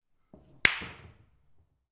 sport snooker cueball strike other hit reverberant